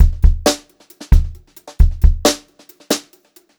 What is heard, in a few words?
Brushed Drum Loops